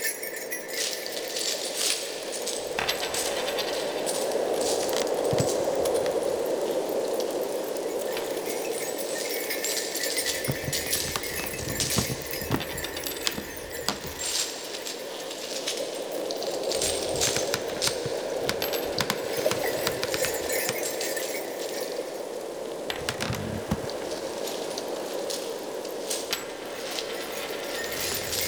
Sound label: Vendor Bed Loop, Ambience; Soul Plane